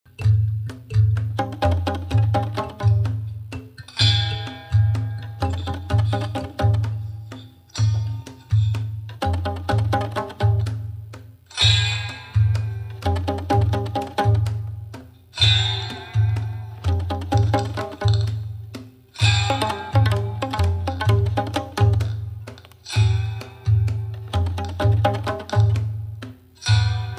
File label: hose water, Gourd Orchestra